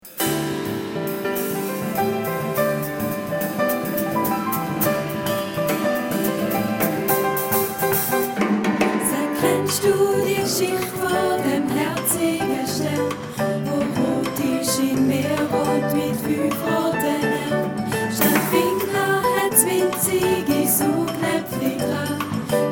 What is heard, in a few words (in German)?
Seestern tiefe Stimme